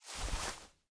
Put Hands In Pockets And Hold The Eraser; LOOPERS

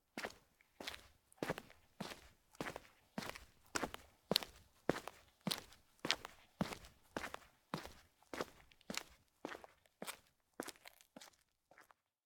Walk; Tyler; LOOPERS

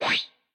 Send Botton; LOOPERS

